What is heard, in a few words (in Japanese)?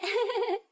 笑